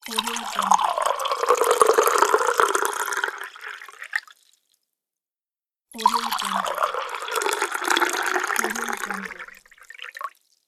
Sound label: the pour water; blackdragonfx